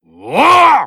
angry; jubei

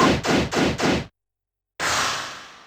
begin investigation; aai

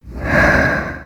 woods breath exhale; juniper

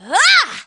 whack; p4u; yukari

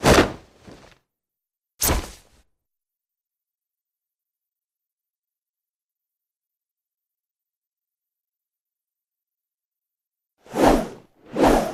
transform; amara

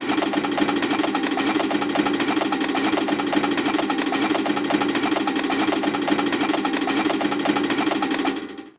ground post; shakey

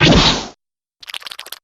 eel chop

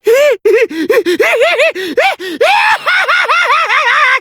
evil laugh; terumi

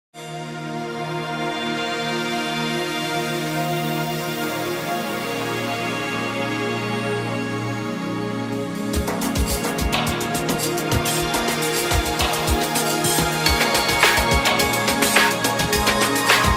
kazoo cover; drv3

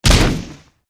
fall; owen

